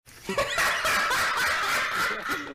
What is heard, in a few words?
goofy ahh lach